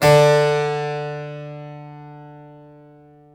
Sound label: harp D3